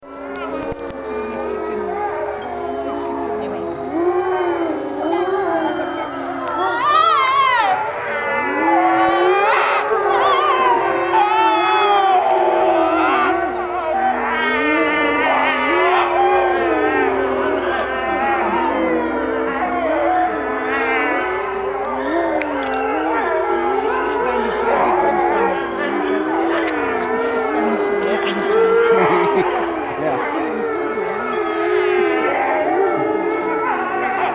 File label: howling, wolf; wolfhaven